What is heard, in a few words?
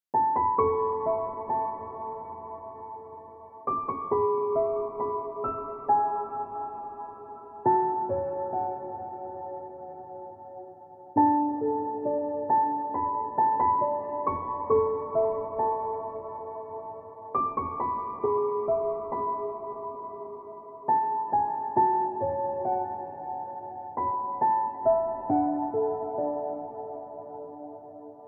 Beautiful Piano